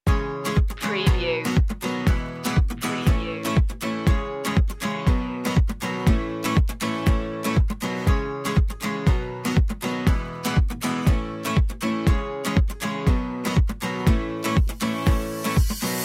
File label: guitar; media music now